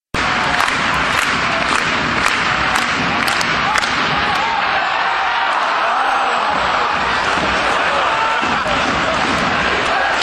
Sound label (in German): Atmosphaere Baskets; Telekom Baskets